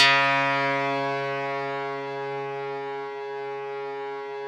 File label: RESO METAL 2 C#3; Yamaha VP-1; Music Store